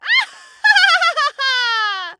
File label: Horror Scary Human Female Laugh Witch Laugh